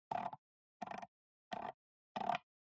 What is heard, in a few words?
PC Mouse Scroll